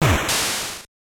Splash

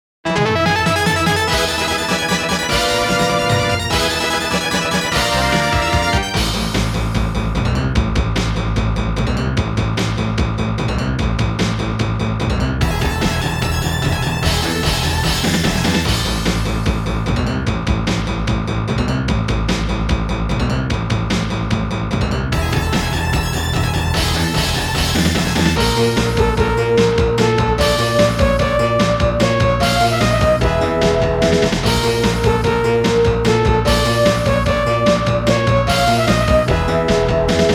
BOSS STAGE CLEAR (MT-32 + Internal); Phalanx

